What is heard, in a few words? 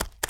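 Trot Dirt, horse; Let's Ride! Corral Club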